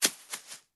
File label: drop bounce grass